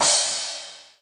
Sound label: cymbal Crash; Temple Run